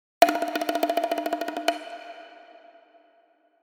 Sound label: Perc Fill